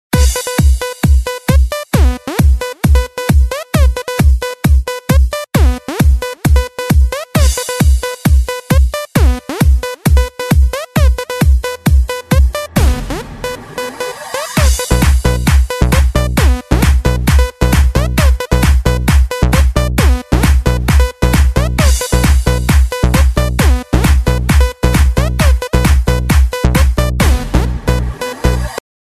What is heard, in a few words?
House Music Ringtone